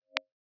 violin symmetrical IR as originated from spectral definition and IDFT